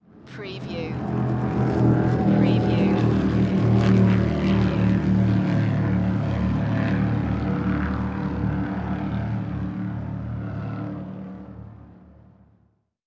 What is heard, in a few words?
Speed Boat Pass By